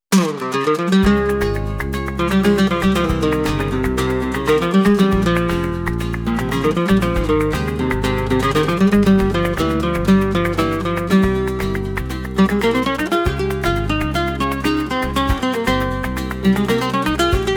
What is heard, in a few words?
flaming strings pasos de flamenco